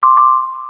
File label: ping; NATICQ2; Naticq pack